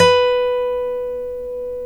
folk steel, guitars

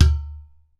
UDU TONE, African Perc; E-MU Formula 4000 Series Vol. 4; Earth Tones

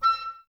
OBSE 6, Oboes; Miroslav Vitous - Woodwind Brass Ensemble

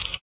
slip slap; Spectrasonics; Metamorphosis

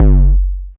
sub, sub bass; Techno ID; single-drums; subbassdrums

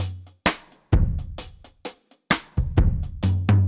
Drums B Dry 130, dub drums; musicradar

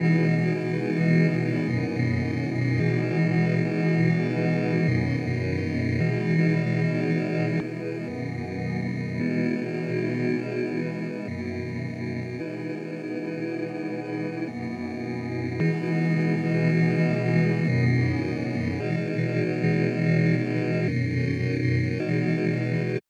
pad, breakdown B; M8

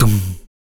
TOMS PITCHD, Male Perc, Vocal; Spectrasonics Vocal Planet; Jazz FX

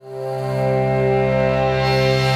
DD SYN, Disco House; Best Service Dance Mega Disco House

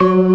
ORGAN, C3 PERC; Keith Emerson Signature Series

